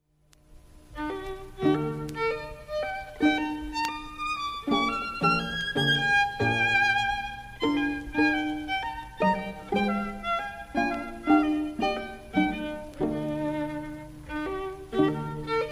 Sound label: KV 421 Trio